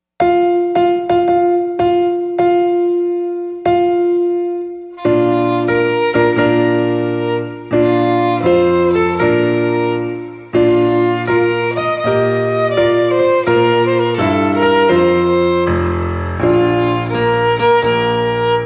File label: Bridal Chorus